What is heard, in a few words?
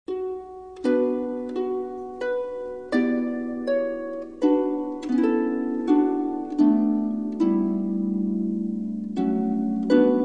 Strings, Christmas